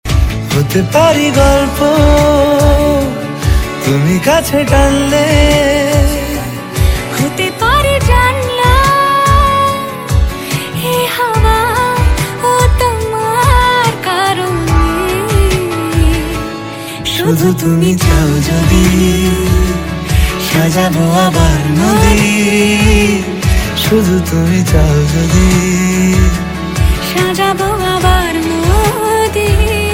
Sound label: bengali ringtone